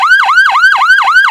yelp, sirens; canada

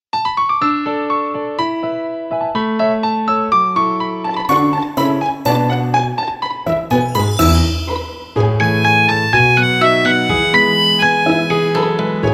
no logo ver acoustic; SRT; Train Announce

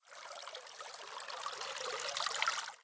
Shishi Odoshi; blue archive

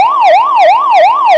yelp, sirens; code3 norway

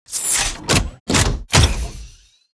Heavy Tank Railgun; blue archive

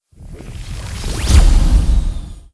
Craft Complete; Node Craft2; blue archive

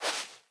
Sand Foot Step; blue archive

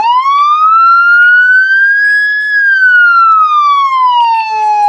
wail, sirens; sq zone

